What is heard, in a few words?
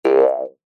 cartoon bounce spring jaw harp